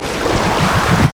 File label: hydro blast warn; nami base